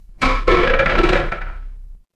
door creak

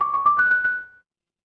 ui alert

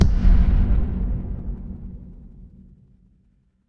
transition impact hit

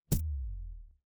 feedback fail

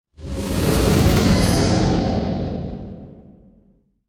magic scifi spell

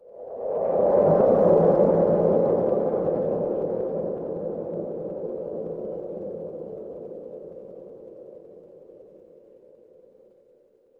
horror wind whisper

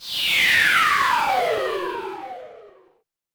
magic scifi laser

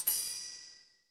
ui chime

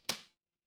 ui toggle

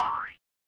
cartoon boing

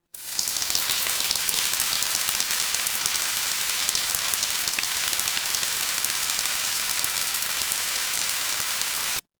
ambience rain

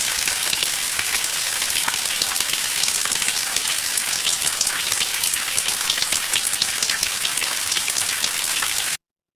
ambience rain

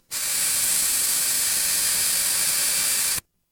fire electric spark

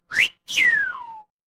cartoon slide whistle

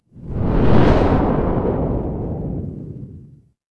magic scifi rocket

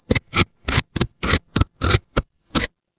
mechanical gear

